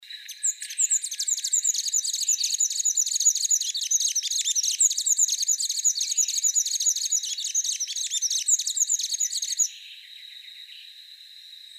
Canto da saira do oeste

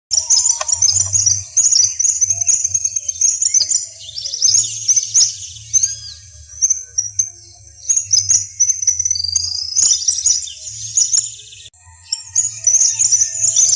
Canto do alveola de madagascar

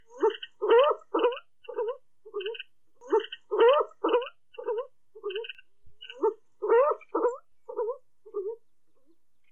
Canto da Garça Real

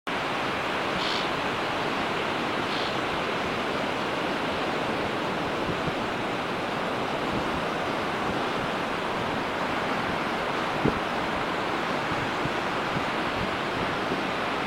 canto do gaivota maria velha

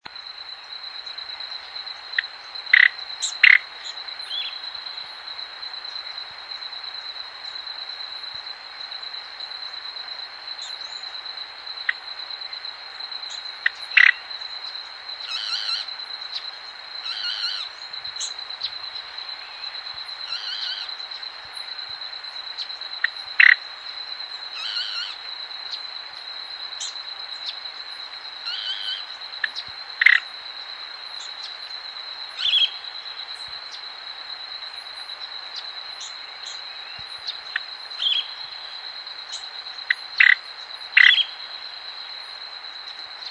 canto do tororo

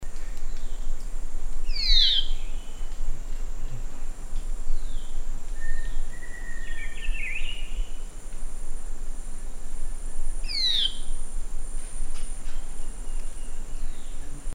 canto do uirapuru vermelho

